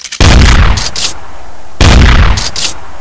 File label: Shotgun